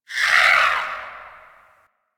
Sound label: Venus Landscape Cave Creature Calls; Ambience; Warframe; Venus Open World